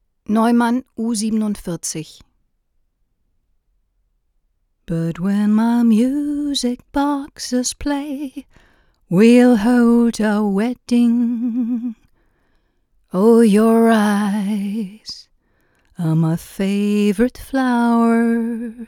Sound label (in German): neumann u 47 Gesang